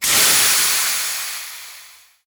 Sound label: steam release, train